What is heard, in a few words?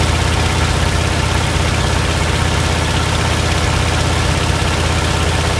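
zero cockpit left, engines; War Thunder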